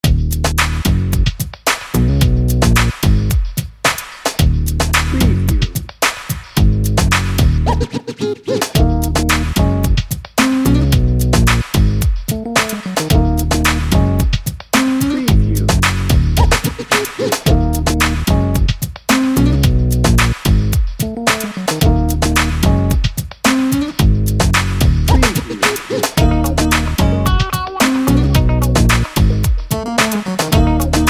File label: cool funk